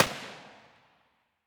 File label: muzzle mono far, weapons